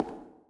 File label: wood, Footsteps